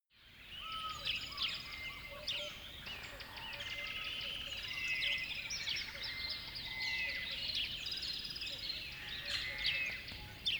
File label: river bed dawn birds call quack